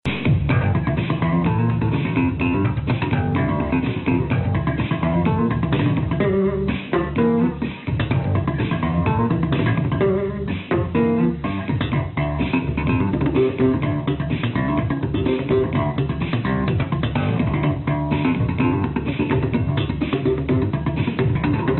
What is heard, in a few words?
slap groove on my jazz bass